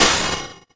Perc Hit, drums; Drums & Drones

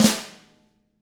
FLAM; ILIO; Double Platinum Drums 1

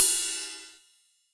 RIDE, HR-16 Drum Mashines; EdgeSounds

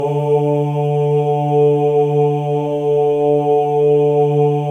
male ohs; Spectrasonics; Symphony of Voices

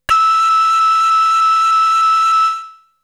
Flute; ZERO G - Datafile DISC 2

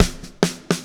LA SHUFF

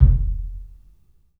KIK, LIVE CLUB KK, Drums; 3D Sound

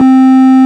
ORG Farfisa Clarinet C 4

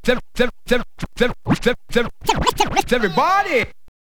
scratch kit, vinyl

